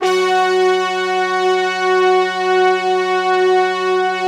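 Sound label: brass tutti, Slow Brass Tutti; Optical Media International - Sonic Images Library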